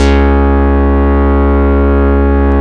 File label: Synth Bass, Analog Bass